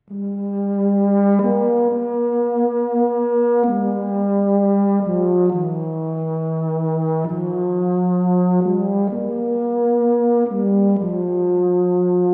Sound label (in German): Tenorhorn